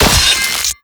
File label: attack claw hit; Thalassophobia Restored; Humanapostle